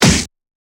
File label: Kung Fu Fight Sounds; Kung Fu Fight Sounds Pack